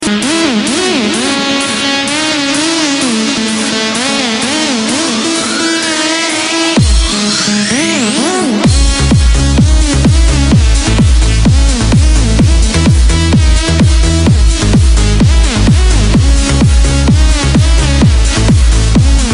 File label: stereo electro, ringtones